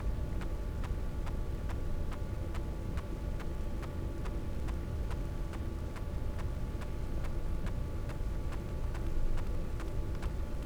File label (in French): cachalot positif, Physeter Macrocephalus